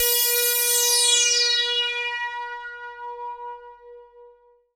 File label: A PAD, ATMO PADS; Best Service; XX Large